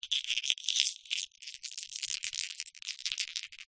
leisure toy ballbearing maze plastic movements

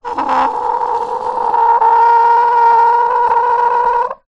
plastic object small slide scrape squeak; foley

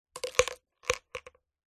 leisure toy marble drop into plastic container

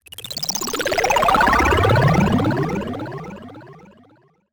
sound design delayed ascending beeps

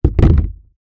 industrial plastic bucket down; zapsplat

